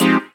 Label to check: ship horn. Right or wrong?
Wrong